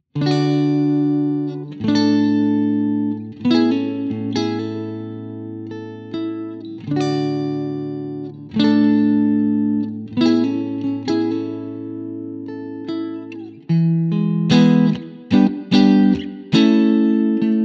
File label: clean; jensentone